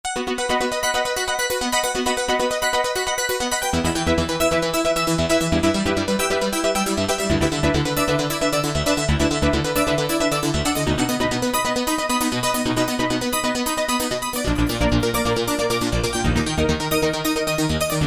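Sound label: Sequence FM Line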